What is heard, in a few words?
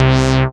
SYN SH, Roland; Sound & Vision - Gigapack I CD 2